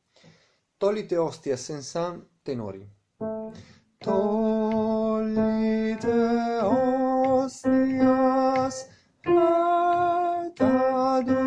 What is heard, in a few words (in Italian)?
tenori; Tollite Hostias